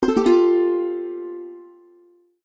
Santoor; Samsung Z3; Alerts

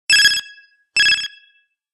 Alert Chirp Twice; HTC Touch Diamond

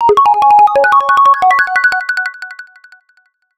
ringtones; Yarrow; HTC One M9

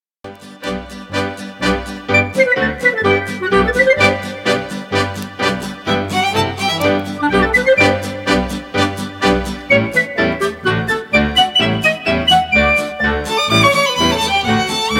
Military march, ringtones

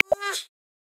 Two-Toed Sloths; Xiaomi Redmi-Note-8-Pro

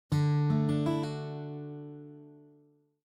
Guitar; BLU; Dash-M2

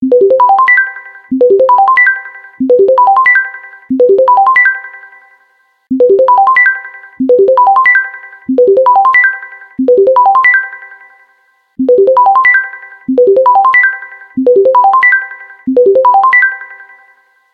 Bubble

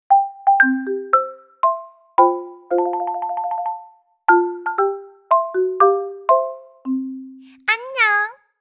Robot cleaner, phonetones; LG; Living

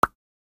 Water Drop Notification; Xiaomi Redmi Note 12 4G

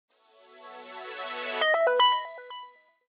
Power Up; GD570AW dLite

